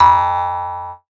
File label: Jaw Harp; musicradar; cartoon caper